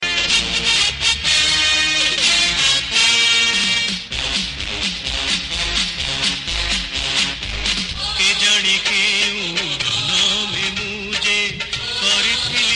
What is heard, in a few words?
Oriya Old Film Songs; rana bhoomi